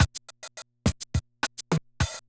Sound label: Loop, classic machines, rpm 40